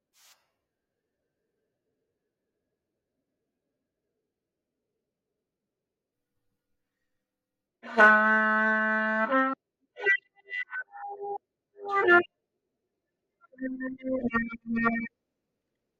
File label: trumpet; trumpet lessons; Reflection Recording